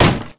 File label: metal destroy